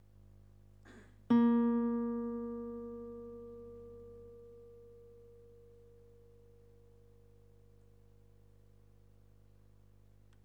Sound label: guitar